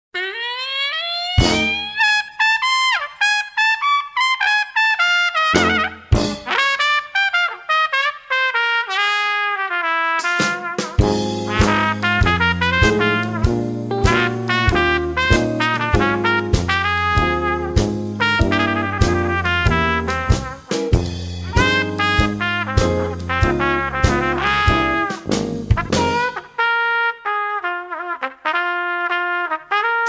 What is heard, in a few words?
dixie wildman blues